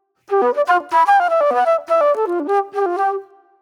Movie Flute; Sonic Foundry (Sony Creative Software); Manhattan Flutes; Super Brown

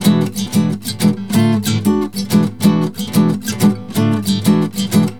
GUITAR; USB Soundscan vol.63; Balkanish Traditions; AKAI

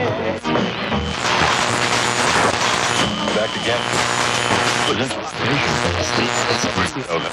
Radio Noise, Short Wave; Sonic Foundry; The Electronic Music Manuscript Collection; EVP Short Wave Recordings

